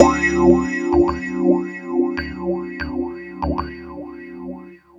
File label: Electric Piano, Loops, Keys